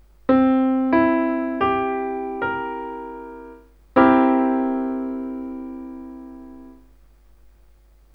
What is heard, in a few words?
dominant seventh